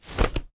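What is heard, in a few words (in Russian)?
щелчок металлических спиц в зонтике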